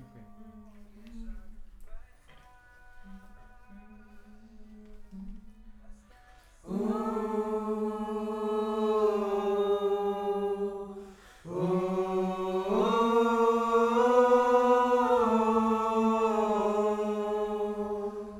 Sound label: Choir Far